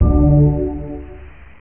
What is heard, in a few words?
connected; tkabber; psi